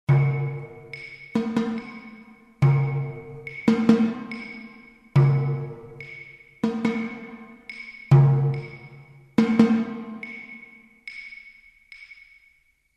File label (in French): rythme